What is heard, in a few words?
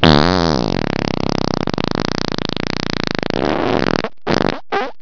Farts; Motor / Boat